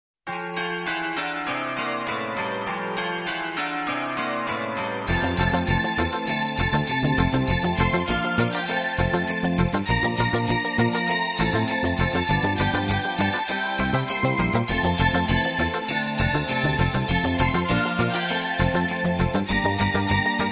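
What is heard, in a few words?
Jingle Bells (No Drums), Christmas Themes; Focus Music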